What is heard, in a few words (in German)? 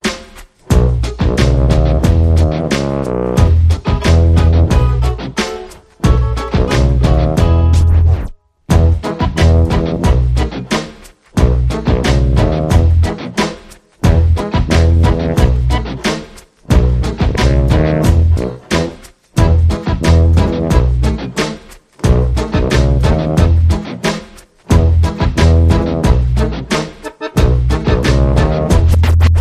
Oide Zither Zipfen